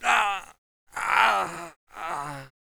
player die

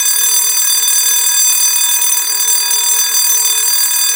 fire alarm

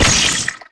fire, hornetgun

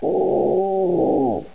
zombie idle

